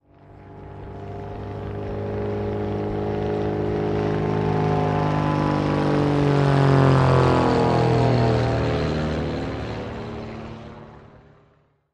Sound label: Plane Hurricane overhead By 2, Airplane Hurricane overhead by 2 propeller